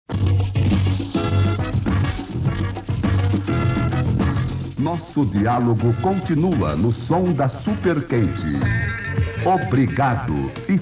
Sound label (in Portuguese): Vinheta Pediu Tocou Ganhou; Radio Excelsior 670kHz 1973